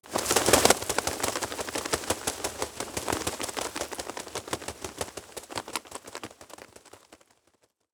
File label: Birds of Prey - Light Wings Flapping Multiple Flaps Close Perspective; Selkor Studio